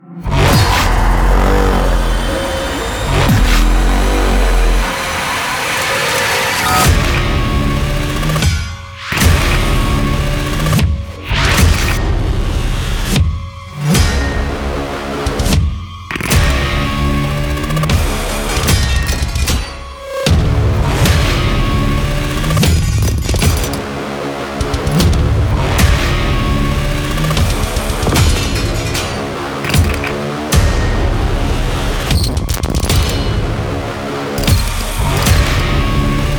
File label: Cue Loop Short